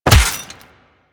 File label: Melee Fist Impact Robotic Flesh; Warframe; Weapons; Fists